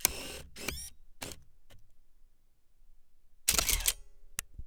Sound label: AUTOFOCUS